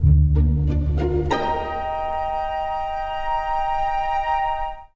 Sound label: success; Panda